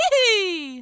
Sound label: "yahoo"; pauline; SM64 CO-OP